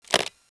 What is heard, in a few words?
briefcase, office